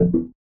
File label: order pickup; orders